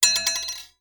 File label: knife blade bounce on hard surface